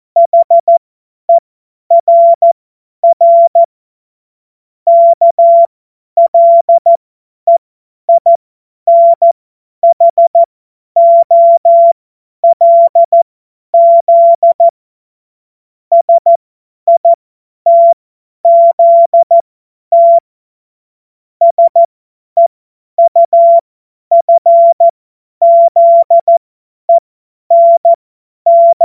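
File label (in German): Witz, Morsekurs, cw morsen; Witze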